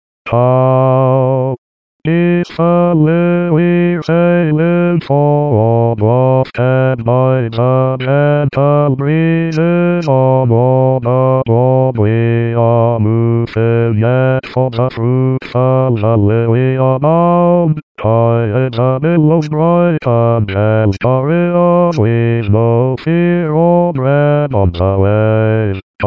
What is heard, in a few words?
digital voices bass